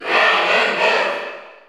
Cheer Spanish; Ganondorf; SSBU

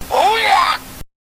Wiimote Sound; Ganondorf

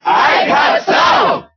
Cheer German; Ike; SSBB

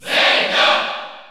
Cheer French; Zelda; SSBU

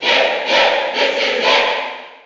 Cheer; Pit; SSB4

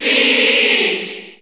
Cheer Spanish; Peach; SSBU

